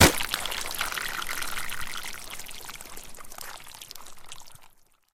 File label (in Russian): rezkij gromkij zvuk vistrela po rezinovomu sharu napolnennomu vodoj